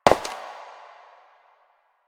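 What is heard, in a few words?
Step Metal, footsteps; Warframe; Koumei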